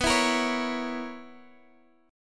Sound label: B Major 7th Suspended 2nd Suspended 4th B down Guitar DADGAD